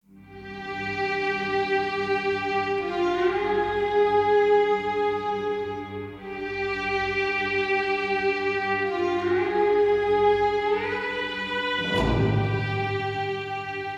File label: Black Ring Strings; Age of Conan Hyborian Adventures